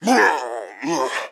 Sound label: Shield Death; Pecheneg